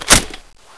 m60 close; dod polskiemiasto

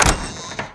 door move; dod polskiemiasto

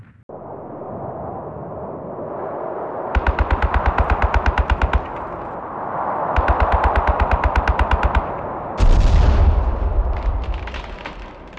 boulevard ambience

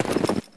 player snow

